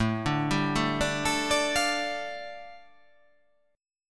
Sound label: A 7th Suspended 4th Sharp 5th, A, down